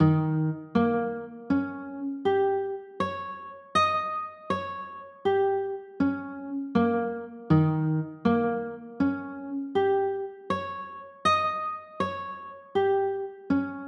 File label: d 7 sharp 9, chords